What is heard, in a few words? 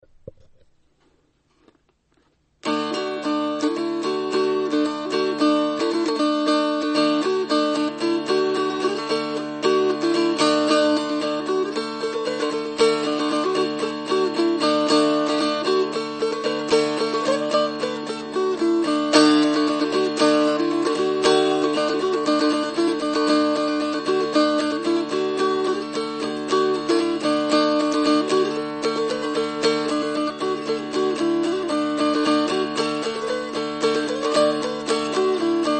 Strumalong